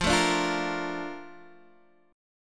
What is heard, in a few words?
A sharp Major 7th F